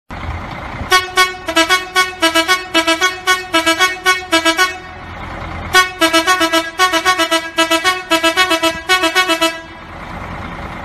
axor modifiye korna sesleri; axor 1840

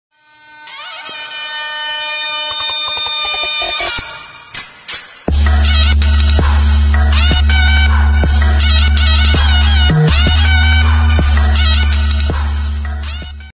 zurna remix zil sesi

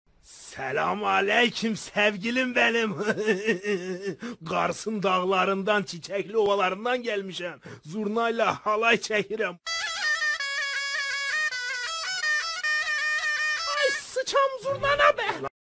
zurna

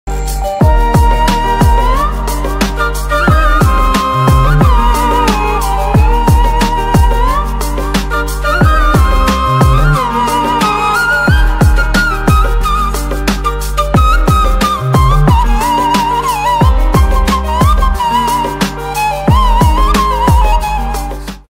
enstrumental

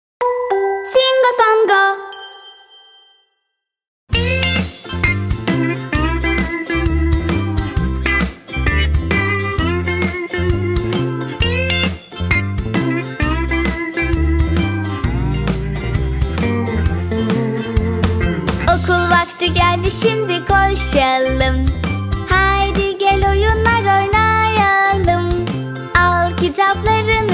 cocuk sarkilari; singosongo tv

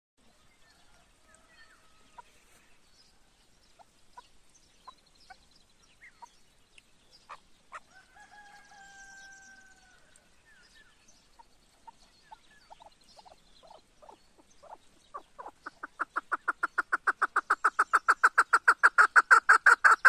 super disi keklik sesi

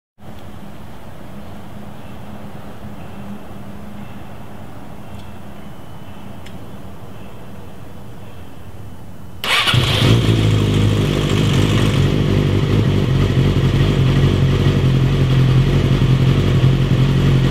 yamaha r6 egsoz sesi; cok fenaaaa

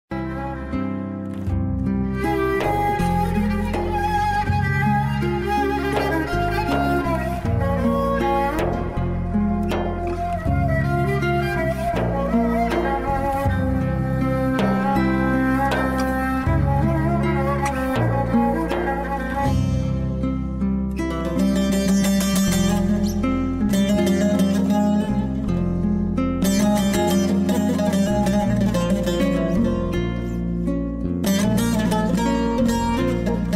keman versiyon; sivri bardak muzigi

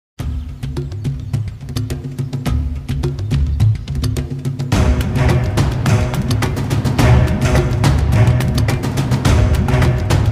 Aksiyon Dizi Muzigi; hercai

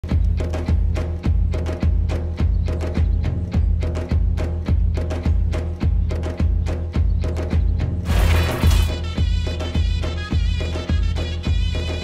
Osmanli marsi hucum marsi; sefkat tepe; dizi muzigi

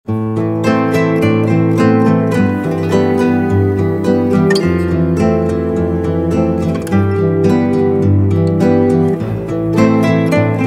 dizi müziği; guzel gunler; dizimuzikleri

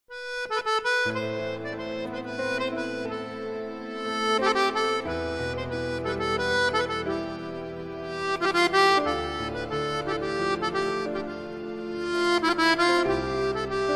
enstrumantal muzik

